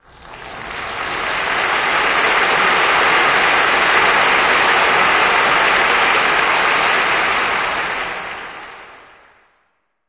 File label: Audience Applause; Barnes The Stage Manager